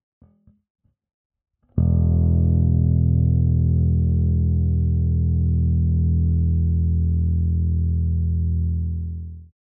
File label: bass E; イコライザー（eq）